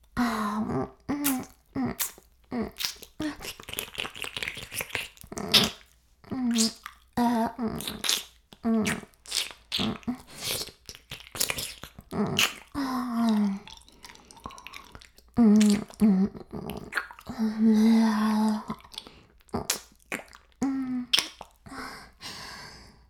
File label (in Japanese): 耳舐め音声, ASMR; 白くて黒い魔性円環